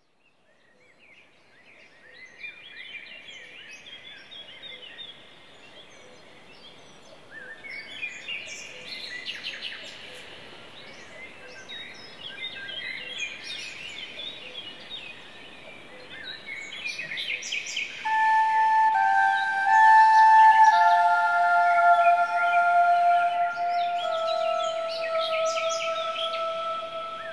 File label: Hypnotic Therapy Music Birds Melody Calmness